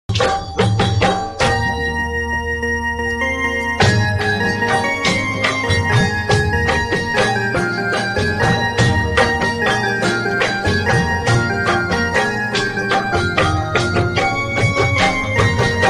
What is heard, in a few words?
piano cover instrumental; navratri